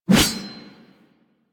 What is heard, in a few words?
Sword Swipe, Melee Whooshes; Warframe; Weapons